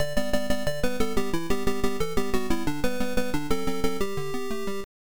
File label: Game Start; magical tree; msx